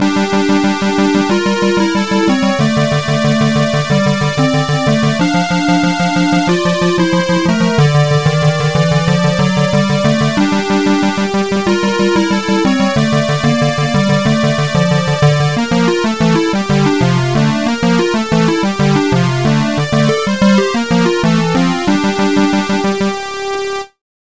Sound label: Boss Music